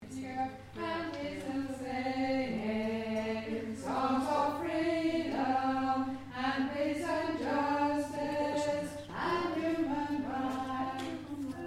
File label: Alto